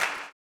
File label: CLAP, blues & soul; ILIO; Vocal Planet